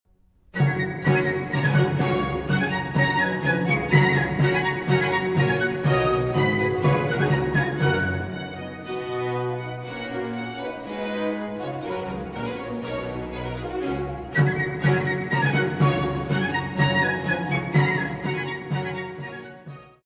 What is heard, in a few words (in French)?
Contredanse; Rameau